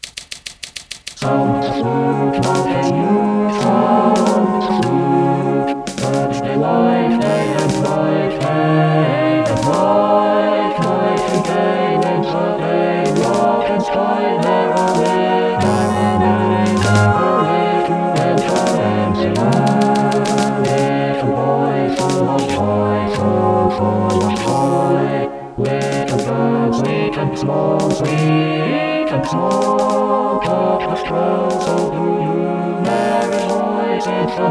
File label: Sound the flute